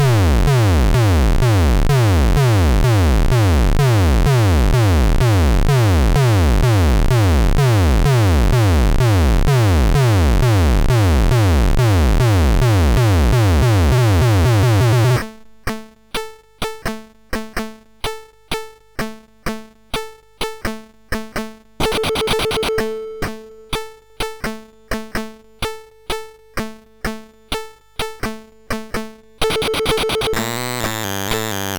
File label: Christmas tune, MOS6581R2